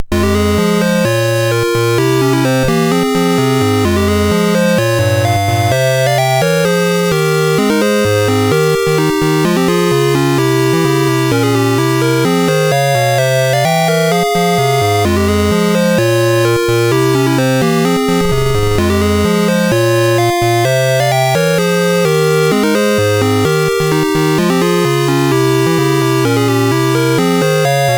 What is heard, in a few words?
Dragonworld sid MOS6581R4